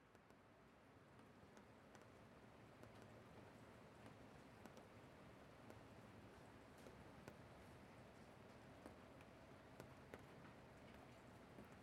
子守唄で寝かしつけ, ASMR

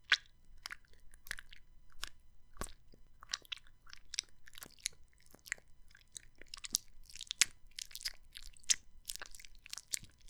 ねっとりピストン

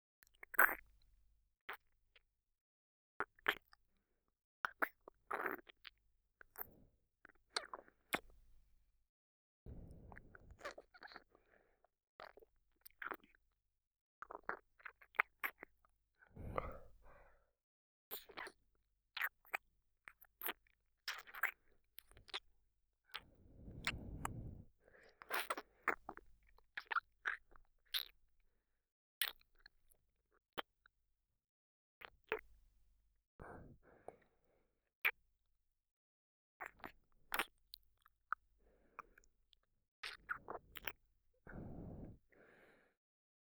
左の耳舐め ループ仕様; クーデレ少年と耳舐め

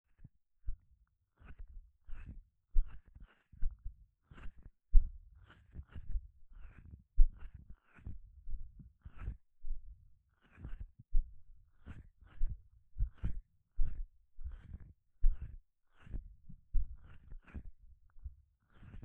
耳のマッサージ, ASMR

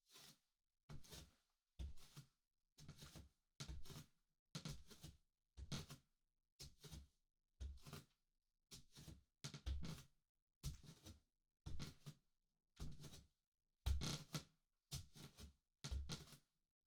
並んで歩く 低速, 裸足 足音; 3DIO

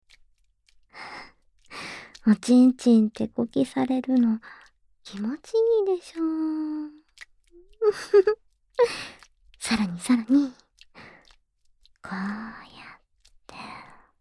超・超!! ぐっぽり 耳舐め!!; ドスケベおねーさんがボク専用の種付中出し肉便器オナホに立候補してくれた件♪

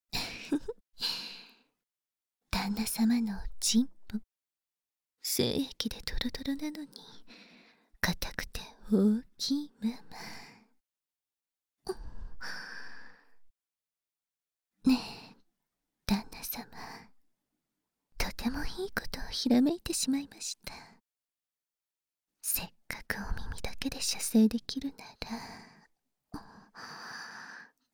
耳触手 脳内囁き（囁き重視編集 効果音あり大きめ）; 触手音Hard; 耳触手+生セックス中出し; バイノーラルマイク

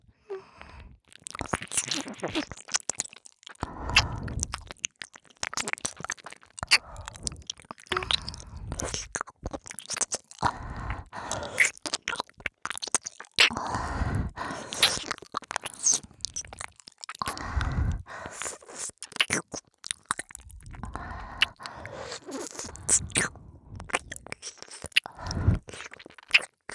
左右耳舐め; 絹華＆翡翠

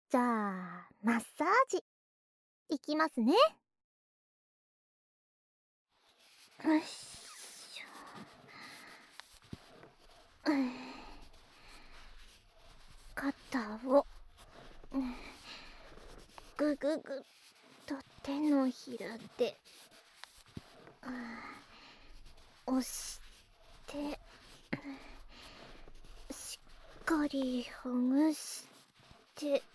マッサージ, ASMR

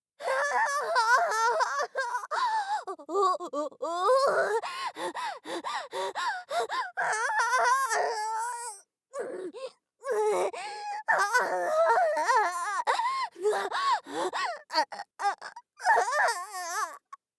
媚薬; 効果音なし

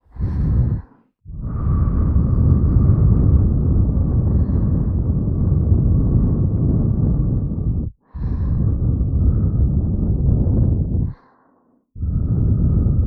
両耳 ふー; 耳舐めタイマー編

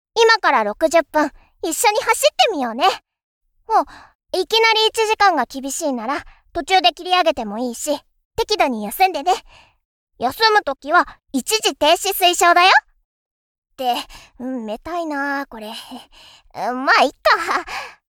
ランニング60分; トレーニング音声

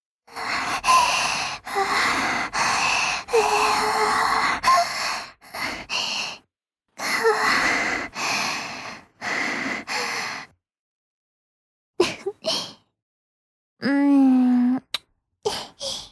絶頂後の息遣い; 実用ボイス抜粋集

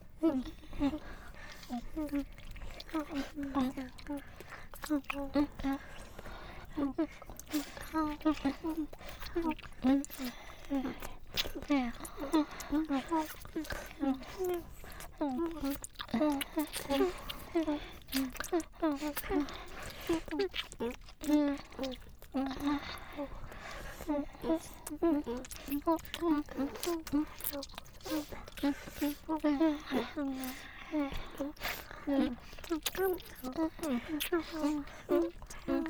ちゅぱれろ耳舐め

